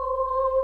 Choir (C)

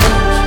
Hit

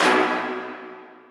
Hit

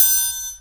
Trap Music Triangle; Percs

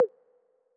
Percs

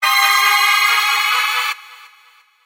FX

